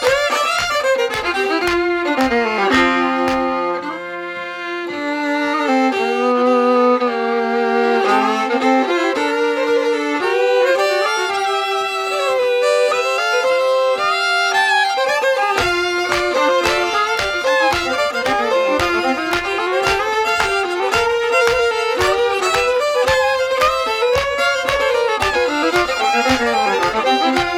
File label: Fiddle Throwdown